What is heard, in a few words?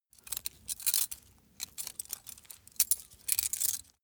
small metal handling short; industrie wirtschaft; metall